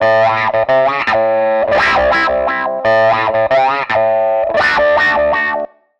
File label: Guitar line, 85bpm, hiphop; musicradar